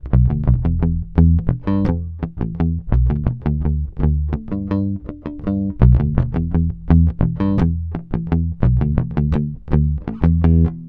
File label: Neck passive pick finger